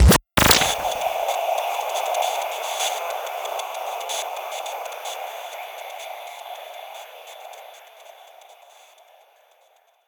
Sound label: Artificial Breather; Plastic Surgery